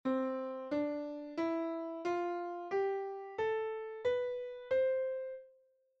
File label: C major scale